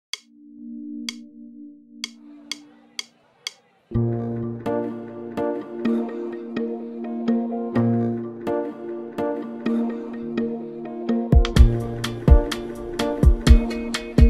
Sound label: Beat